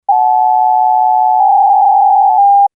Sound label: psk125